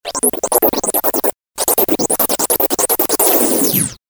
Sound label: beat scatch burst noise